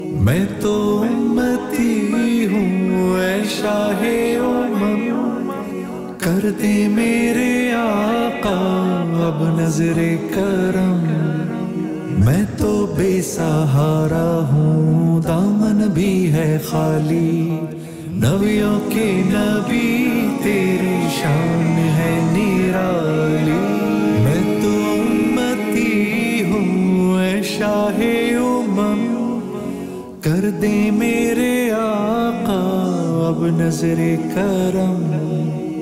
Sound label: Naat Ringtone